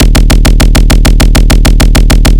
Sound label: doom bass